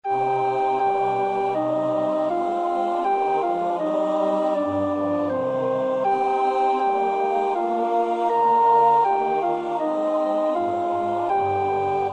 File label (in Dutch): sopraan, koorpartij oefening; Bach (Johann Sebastiaan); BWV 102